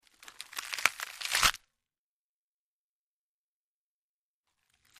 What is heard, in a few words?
Breaking Tearing; punches kicks hits bones